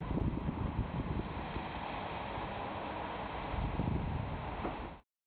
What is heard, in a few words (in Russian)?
vozdushnyiy potok nad golovoy, zvuki skvoznyaka